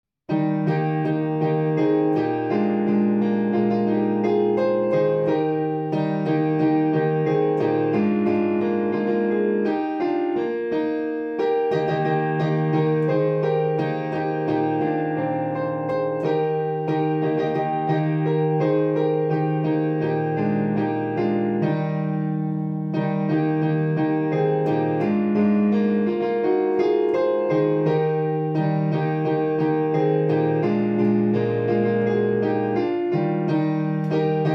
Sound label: Piano; I Never Will Cease to Love Him